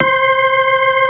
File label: C5, Keyboards Old School; USB Soundscan vol.09; AKAI; SMALL B3